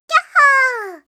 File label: "kyahhoo"; kakegoe